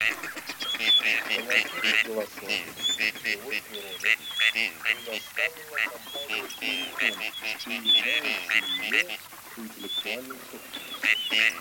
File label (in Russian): Серая утка Canapiglia; plurifon